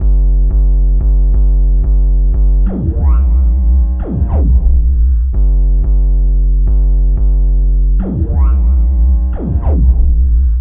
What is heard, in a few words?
Victory Bass, Drum & Bass; Fluid Dynamics